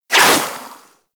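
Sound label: crossbow Tail; Warframe; Tenno; Primary Xbow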